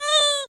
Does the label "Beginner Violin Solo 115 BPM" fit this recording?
No